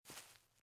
SFX step grass; gamerip; Out of Reach: Treasure Royale

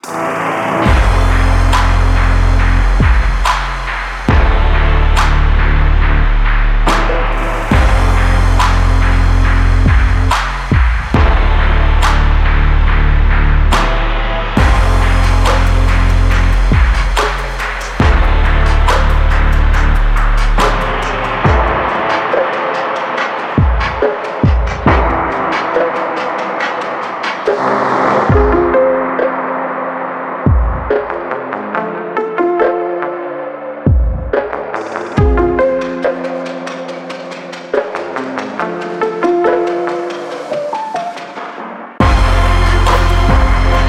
Stab; zapling bygone

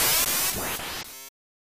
Swoosh

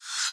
Steam End; Cut the Rope Holiday Gift; android ios mobile gamerip

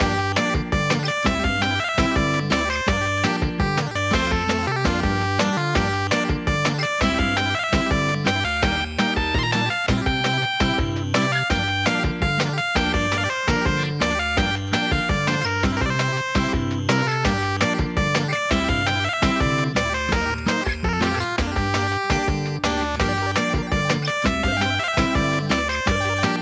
Shop Purgatory Loop; Cursed to Golf; PS4 PS5 Switch Windows Xbox One Xbox Series X/S gamerip

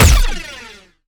Sciweap Shot Medium Laser Rapid Stun Gun

